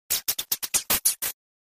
Air Hose Malfunction

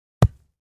Ground Hit Sledge Ham; rocks & dirt

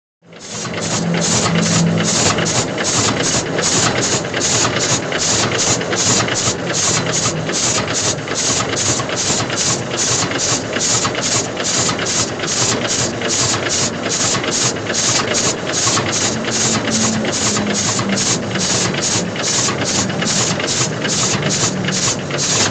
Old Steam Pump Regula, pumps

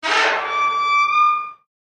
Cell Door Open Metal

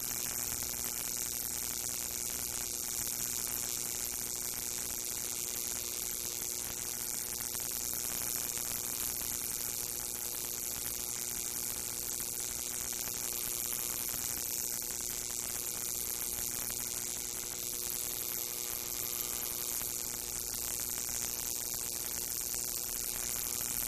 Neon Light Buzz

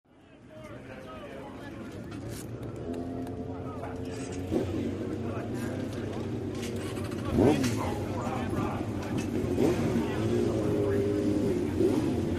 Motorcycle Race, road racing

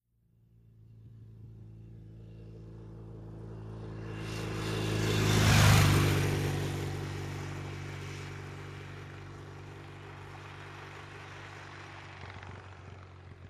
Motorcycle, Norton, By ( 45 Mph ).

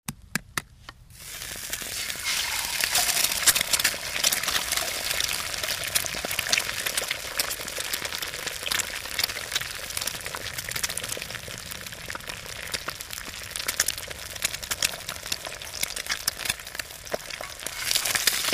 Eggs On Griddle, cooking

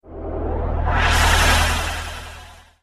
Data Text Scan Low Hum, stinger

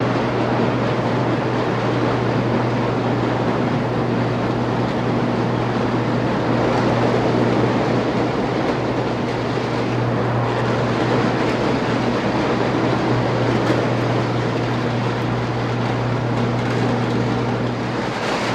Banging Machines Am, factory

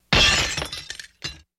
Dish Falls Breaks

